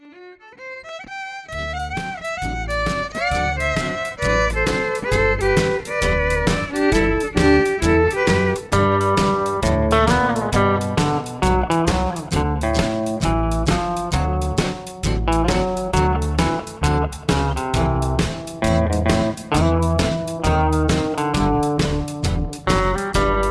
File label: Instrumental Key of E